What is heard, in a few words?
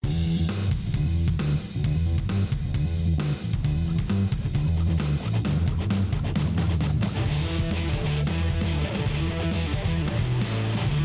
Rock